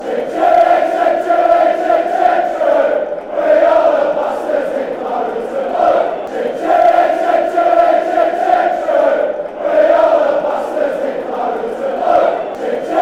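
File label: chim chimeney burnley, football chants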